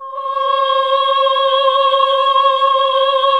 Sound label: OHH C#3, classic vox